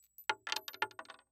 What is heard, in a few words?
Weapon Bullet Shell 40 Cal Shells Multiple Shells Fall On Boardwalk; AdobeCC